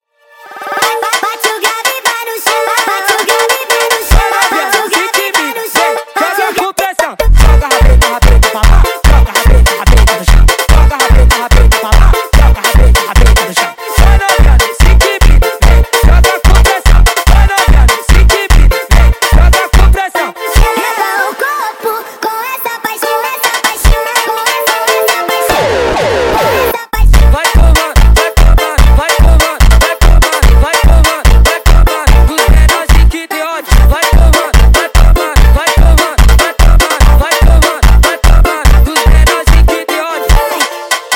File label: Funk sped Up